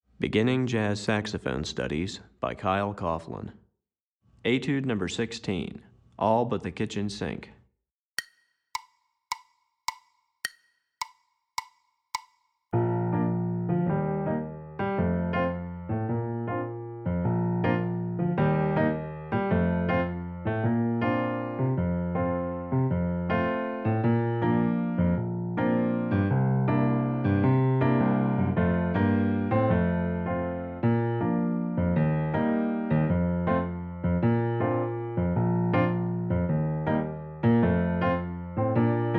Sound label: Piano Part, Beginning Jazz Saxophone Studies Alto